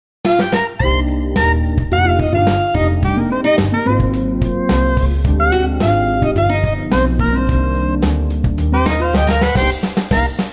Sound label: Funky Smooth full mix